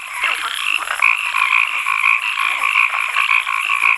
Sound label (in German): Froesche; Break; Austral